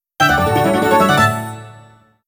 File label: Melody Learned; legend of zelda the the wind waker hd